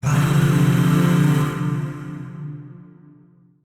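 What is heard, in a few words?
Void Shawzin Minor Chord; Warframe; Zariman; Chords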